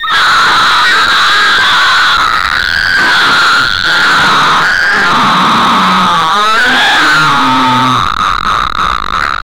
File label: rigid sea beast; sea beast straight; sound effect